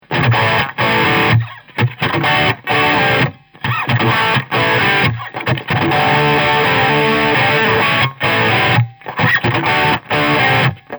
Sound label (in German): Kanal 1 Boost